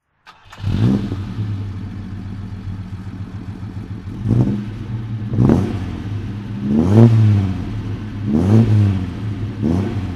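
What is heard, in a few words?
alfa romeo 2600 spider throttle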